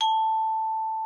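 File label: xylophone A4; Xsample VOL-4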